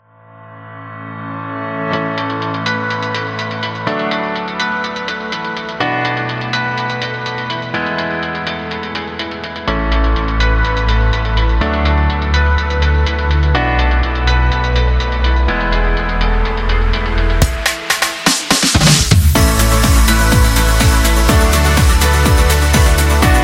Business Success Background, corporate music; corporate music vol 19

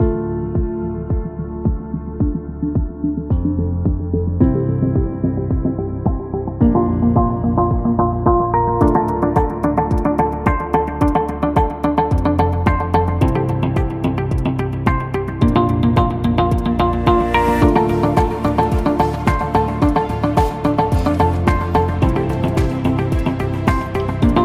Emotional Sad

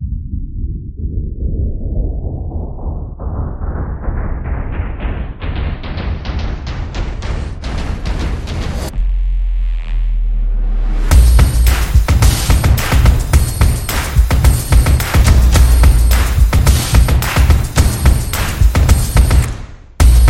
Intro Background; soccer stadium

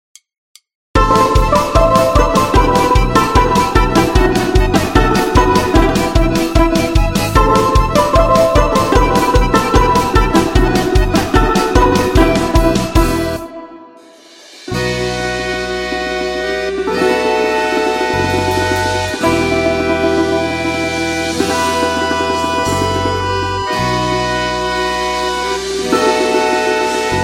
Russian National Balalaika Music; sounds of the world

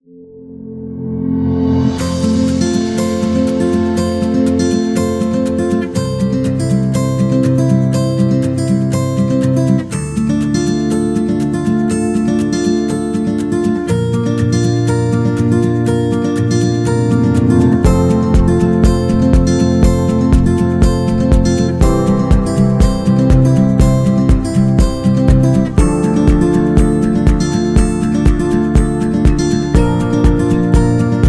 An Inspiration, happy music

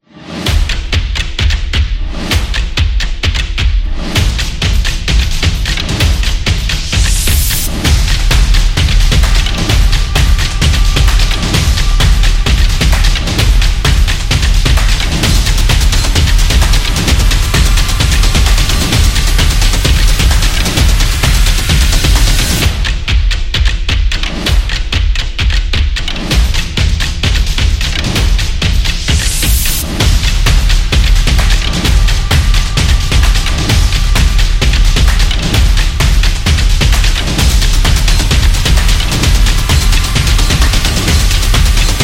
Drums; upbeat drum

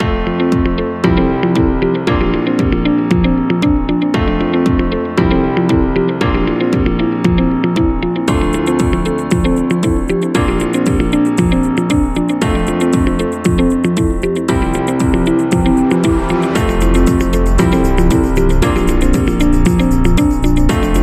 Positive Feedback; corporate music vol 17

